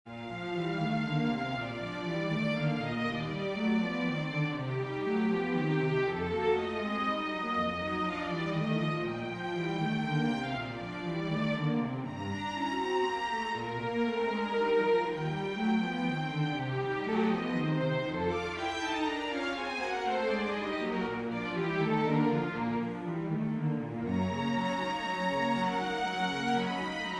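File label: prelude major for string quartet; chopin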